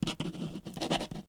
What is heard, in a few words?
Write on Paper with Pen, Office Supplies; 1001 Sound Effects